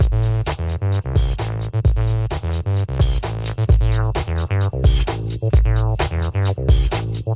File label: 130 funky acid breaks; SYNTH AND ELECTRONIC LOOPS